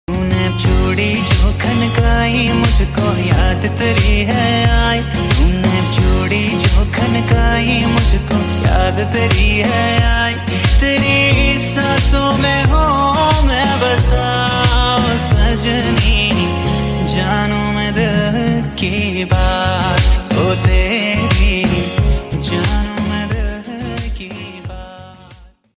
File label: tune bollywood ringtone